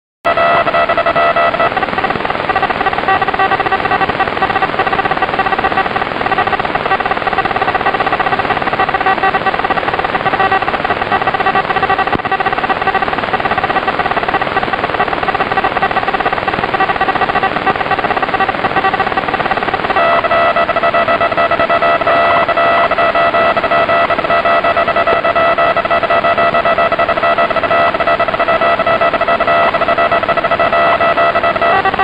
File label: PLC 29000 KHZ AM MODE BW 6 KHZ